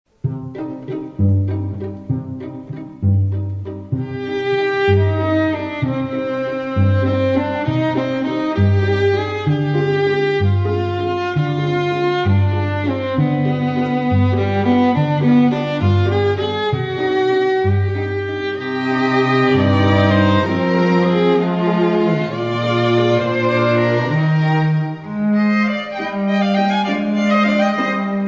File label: Quartet, Waltz